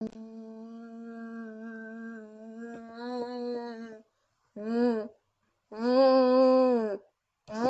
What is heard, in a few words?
bee